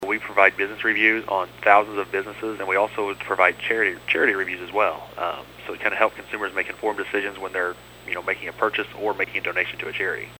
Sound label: keys